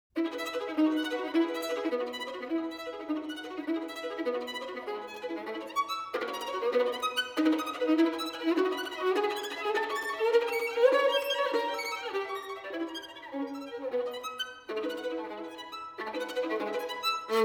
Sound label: Caprice in E Major; Paganini 24 Caprices